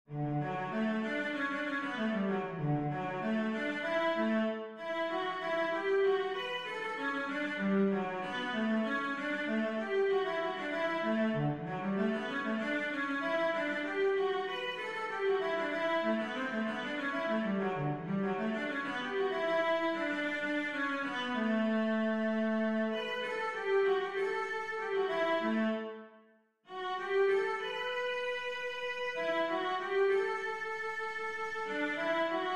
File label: Brandenburg Vla